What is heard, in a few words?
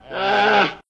wram hit; sinfar haks; sf effects